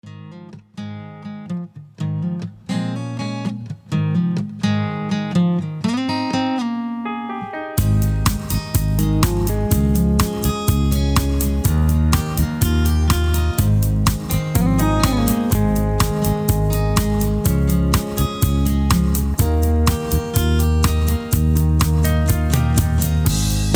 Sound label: Instrumental with No Leads